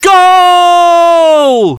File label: goal